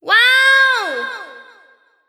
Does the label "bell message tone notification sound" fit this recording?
No